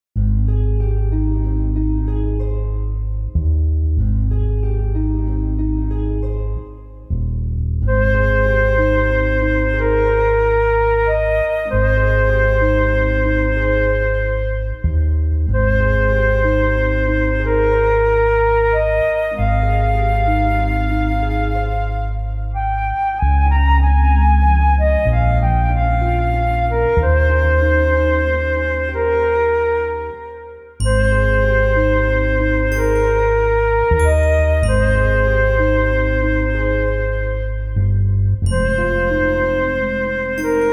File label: On the banks of a flowing river, bgm